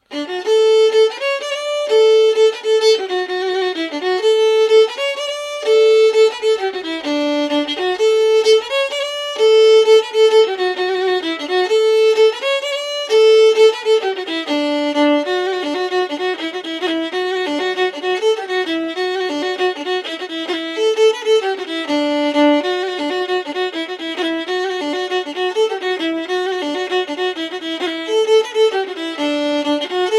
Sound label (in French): Allemande, violon; Vieuxville